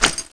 player swamp

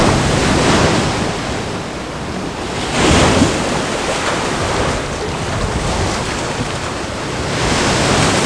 galleon ocean

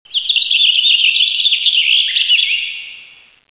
bird

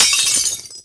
glass

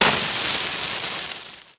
fuse; jake

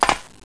player metal

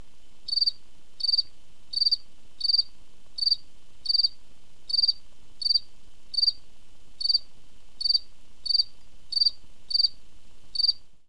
cricket

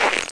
player snow

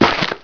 player sand

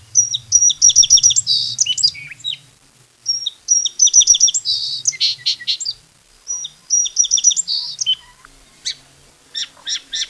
sparrow close

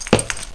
player ladder

